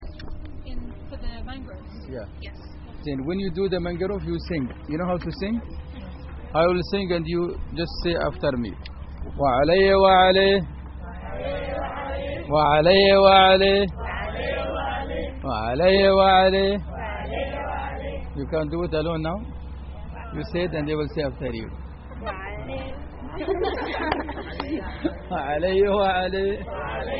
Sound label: Mangrove; Sharjah; M Ali